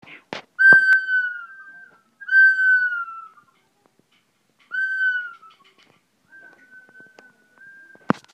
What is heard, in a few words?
red tailed hawk